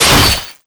hit mana blade; balor

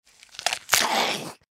ghoul reaver equip; npc

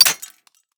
pick break, lockpicking

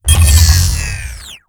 gauss precision stop; doom gauss

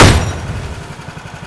fire, weapons; cso; turbulent7

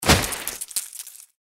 bodyfall; npc; cazadore

